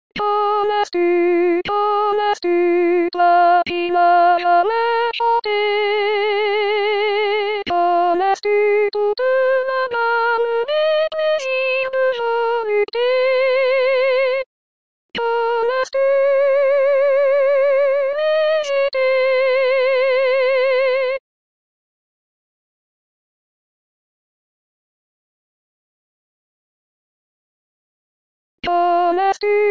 Soprane

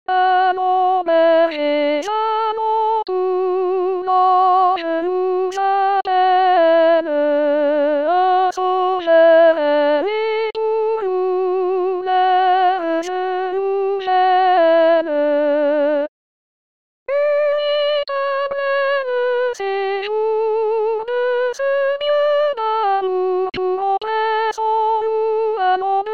Soprane

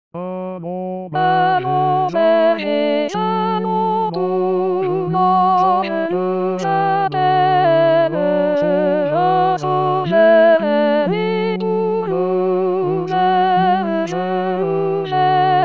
Tuitti

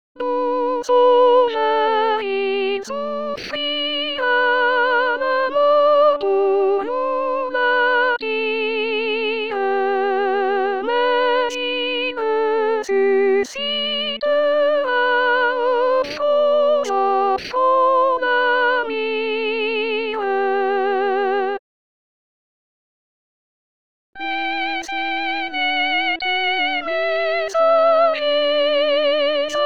CANTATE DE NOEL soprano

